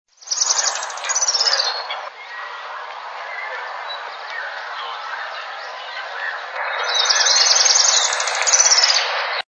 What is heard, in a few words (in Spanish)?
voz pitiayumí; parula pitiayumi